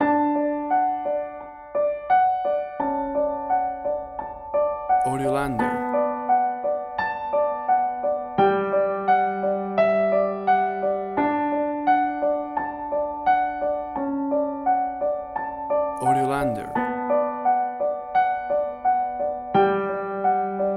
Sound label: Beautiful Piano Music; Beautiful Piano Music. A beautiful piece of piano based classical; AUDIOLANDER